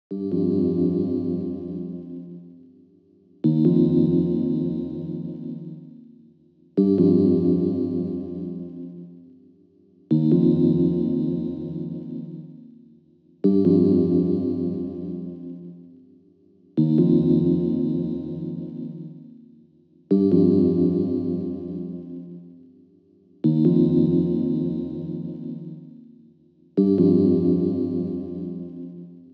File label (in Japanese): 屋敷内BGM